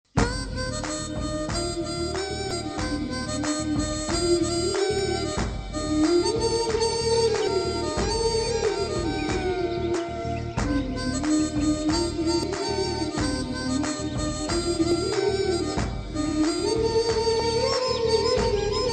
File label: Instrumental Variant; Padaiyappa